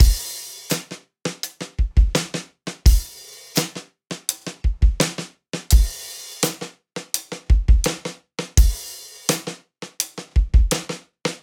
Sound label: 84 Acoustic Drums, Live Trip Hop Drums; Loops; Smoke Signal